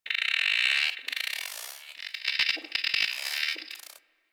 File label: Venus Corpus Data, Ambience